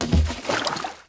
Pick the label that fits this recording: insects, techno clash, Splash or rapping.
Splash